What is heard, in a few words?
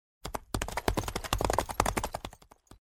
Horse; Microsoft Studios; Age of Empires III - Complete Collection; cinematics